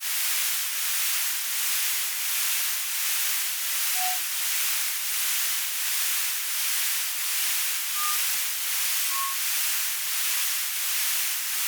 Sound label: radio